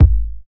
kickdrum sound g sharp key, kick single shot tuned to the G# note. Loudest frequency: 90Hz